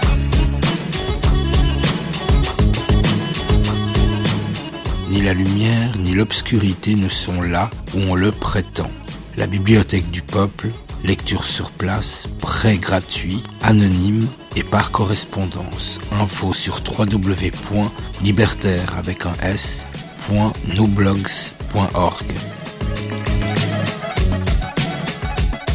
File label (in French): Jingle; Biblio du Peuple